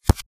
imp bullet lightballistic impactsweetener

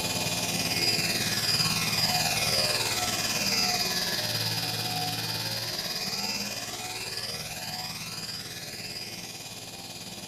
beacon emit giant fan turbine stutter; Titanfall 2

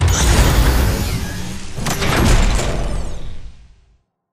bridge close exterior; Titanfall 2

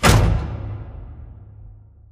sliding door lg verb close start; Titanfall 2

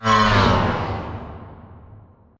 Prop Warehouse Prop Rack Stop Close; Titanfall 2; Boomtown

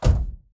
footstep jog; Titanfall 2

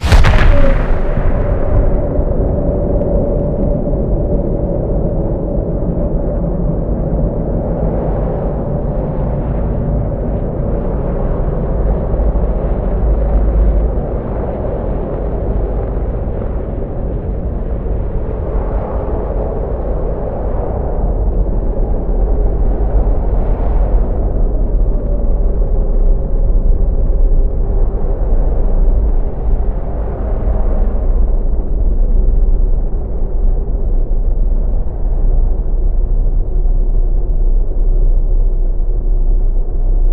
megacarrier entrance; Titanfall 2

